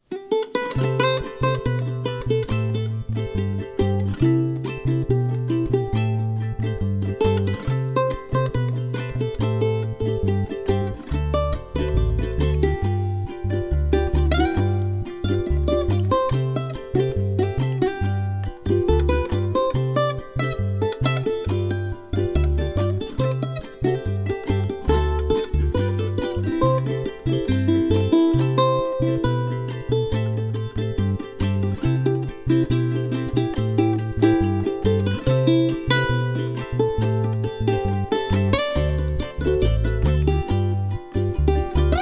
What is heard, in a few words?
Full Band